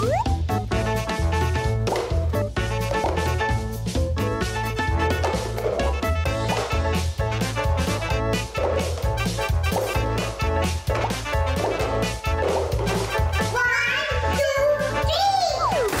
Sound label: cartoon ringtone